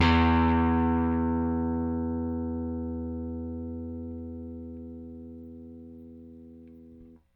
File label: RickThumb-C, RickenbackerBass